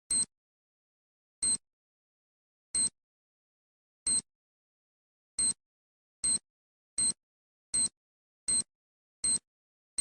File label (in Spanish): Bomba Cronometrada De Tiempo; Tiempo Explosió